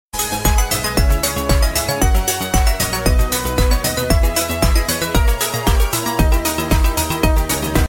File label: Nokia Mms